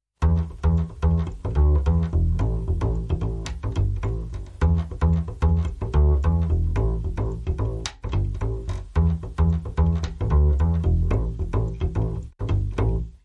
Jive Bass Go Round

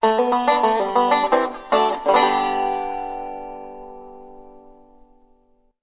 Banjo Lick

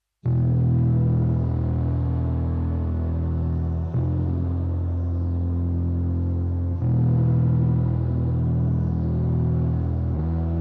Drum Virtuoso

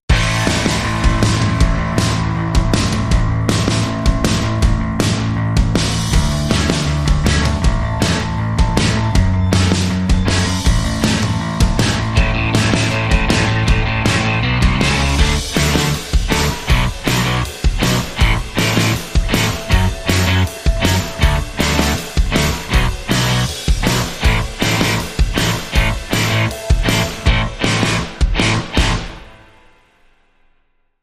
Indie Spark, No Lead

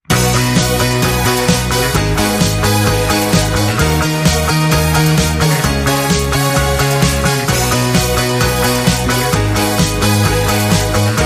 Lower Drum

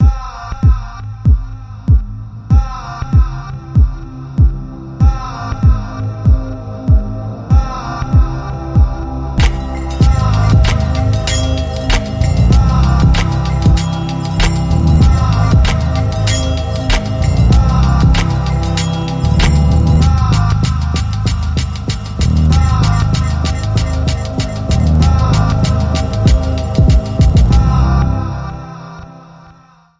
Street Synths

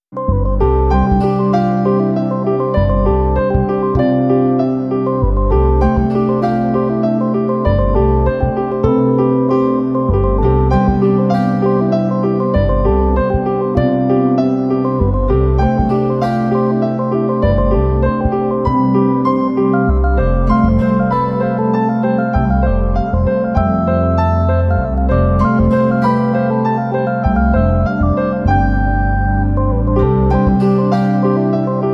Working Together, No Drums

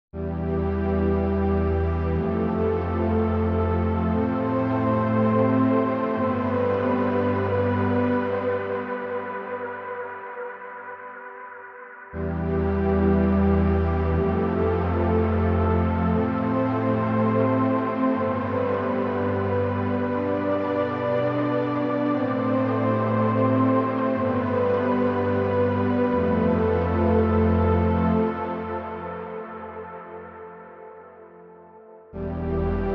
Pad

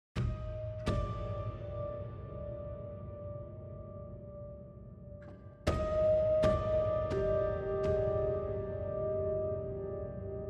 Piano Only

